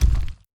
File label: Rock Boulder Hit